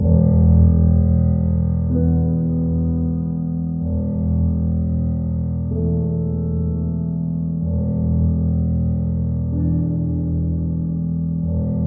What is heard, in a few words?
Pre-boss (189bpm)